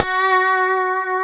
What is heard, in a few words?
F#4, Guitars; Spectrasonics; Hans Zimmer Guitars VOL-1; 90's Sample CDs; COMP POP+VIB